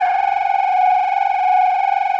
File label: Feed chorused, Feedback FX; 99Sounds; Dark Engine